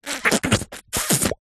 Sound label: cartoon squelch suck